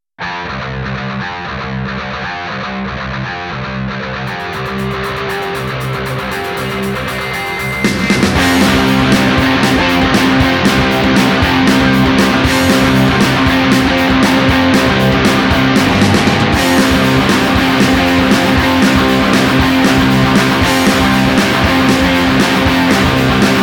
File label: Garage Rock